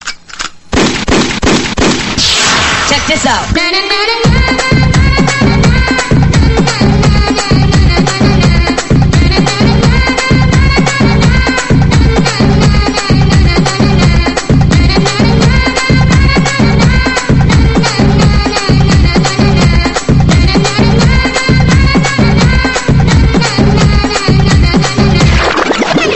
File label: clubmix tamil power; ringtone